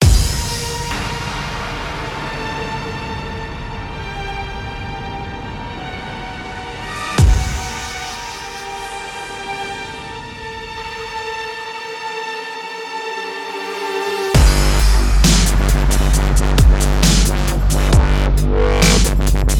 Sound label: Dubstep; MotorStorm Apocalypse